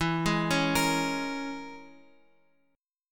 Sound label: E 6th Flat 5th, down, Guitar, Open F; E6b5 arpeggiated; JGuitar